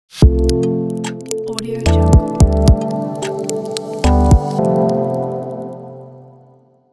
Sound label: spot cool logo revealing